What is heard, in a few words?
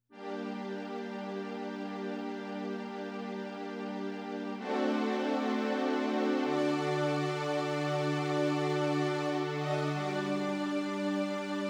synth